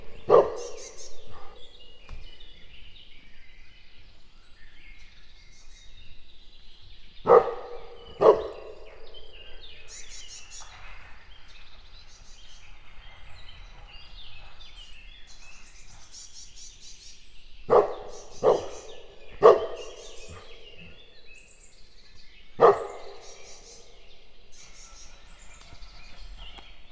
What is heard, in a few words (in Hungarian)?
szundi kulturáltan, kutya; Egerturistaház; termeszetben